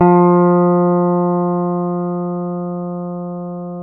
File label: F2, LAP STEEL